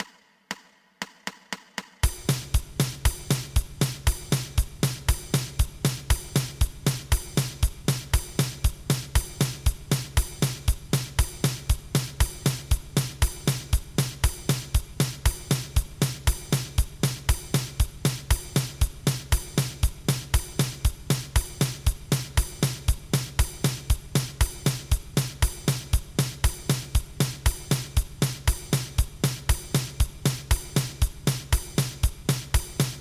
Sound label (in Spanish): 2 pulsos